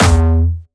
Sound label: Jumpstyle Kick E2; Bassdrums; Jumpstyle Multis; vengeance essential club sounds-3; VEC3 Bassdrums